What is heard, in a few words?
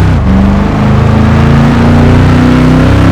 third, vehicles cars; lwcars